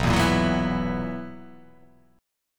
B Major 7th Suspended 4th B down

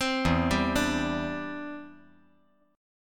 E Minor 7th Sharp 5th, down

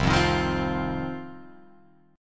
C Minor Double Flat 5th, Guitar, Open F; strummed; 0,0,3,0,3,0; JGuitar

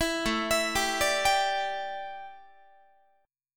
A 7th Suspended 4th, A, down, Guitar; A7sus4 arpeggiated

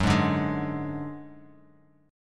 Gb Suspended 2nd Sharp 5th Guitar Half Step Down

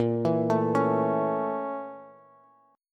Bb Minor Major 7th Flat 5th, Bass; BbmM7b5; arpeggiated; 18,19,19,18; JGuitar

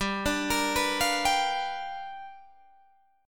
G 7th Sharp 9th G chord, Guitar Open D

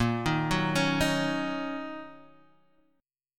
Gb 6th Bb

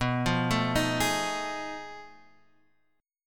F 7th Sharp 9th Flat 5th B

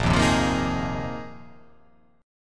A sharp 7th Suspended 2nd Suspended 4th

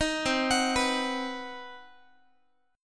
C Minor Major 7th Flat 5th chord, Guitar

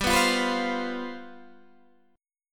G 11th, Guitar, Standard; G11 strummed

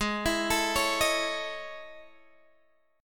A Major Sharp 11th, G sharp

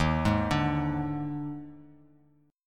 D sharp Major 7th Suspended 4th, D sharp, down; arpeggiated; D#M7sus4; JGuitar

